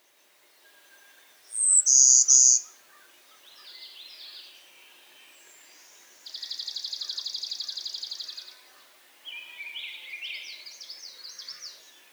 Golden-winged Warbler Vermivora chrysoptera x cyanoptera